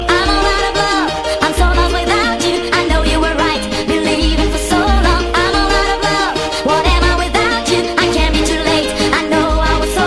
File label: Dj Vibes